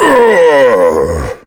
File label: Smiles pain